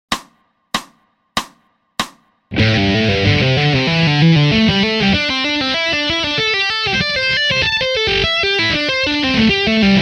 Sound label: Tapping Lick A Min Pent Slow